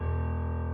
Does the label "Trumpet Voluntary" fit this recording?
No